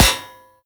metal, bullet impacts; physics; Half Life Model Pack

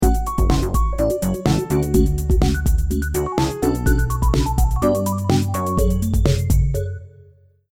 lost round, music

